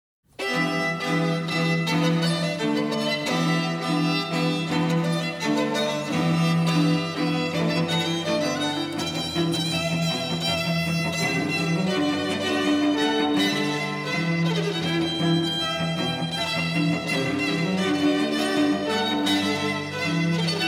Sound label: String; Trio of Violin, Viola & Cello